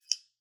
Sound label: scissors